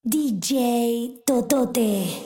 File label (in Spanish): jajajajja